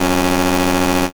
buzzer open

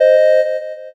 horn

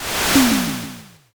fire magic

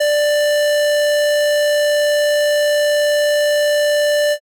ecg flat

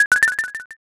chip

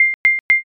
timer done

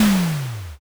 rock crush

